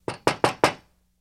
Knocks On Glass Light

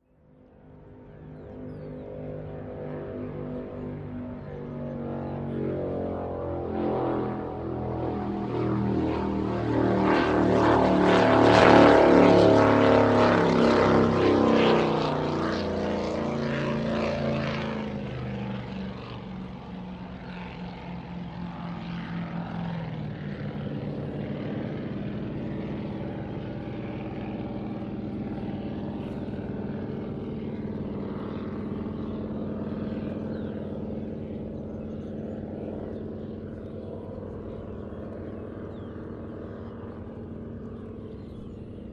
Power Boat By Birds, off shore power boat

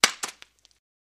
Break Wood Stick Nec; crashes & creaks

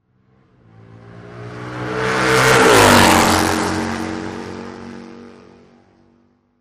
Motorcycle Road Racing

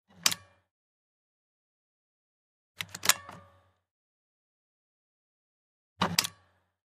Computer Cdr Laptop, computers

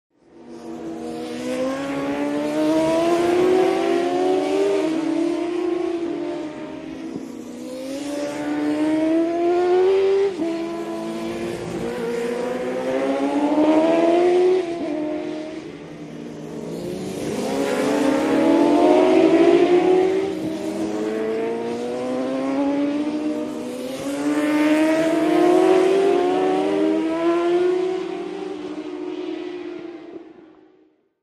Motorcycle Road Racing

